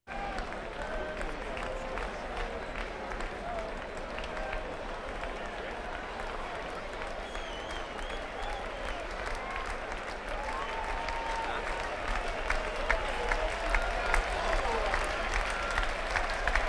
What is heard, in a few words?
Crowds Race cars Baseball; Valentino Production Sound Effects Library